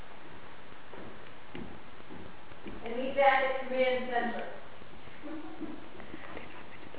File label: Parlor with laugh and whisper